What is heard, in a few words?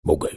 attack; Armordillo